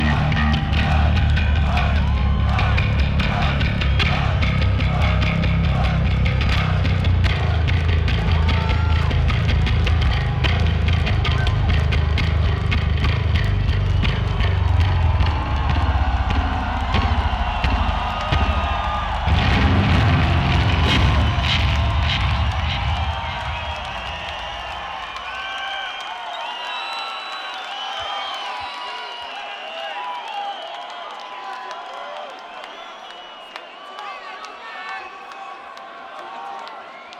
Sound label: Bass Solo